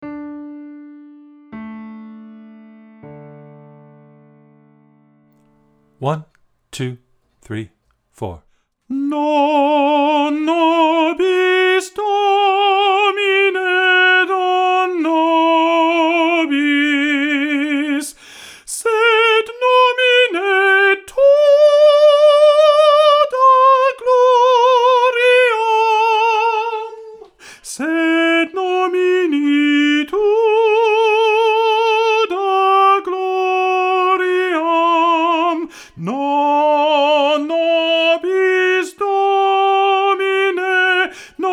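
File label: Soprano; Non nobis domine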